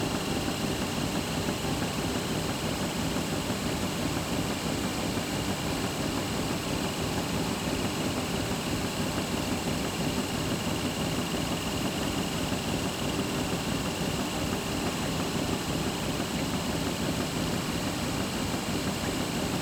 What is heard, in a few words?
Cardboard Factory Machine